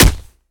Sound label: melee character fist; viper